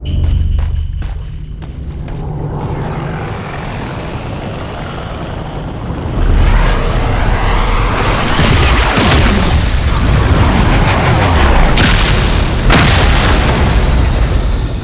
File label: Crash; Warframe; Awakening Remaster; Cinematics; Liset